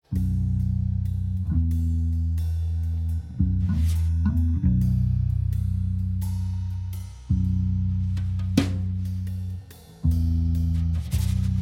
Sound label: Slow Rain